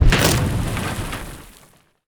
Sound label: siege boat impact; ships siege